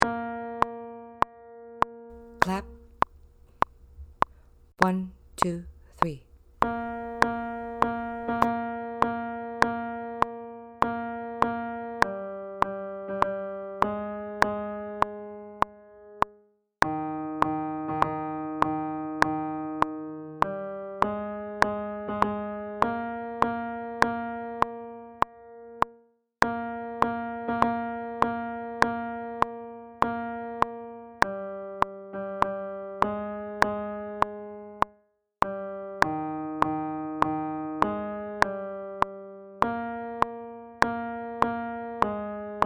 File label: Tenor Alma Mater guide track; Tenor practice track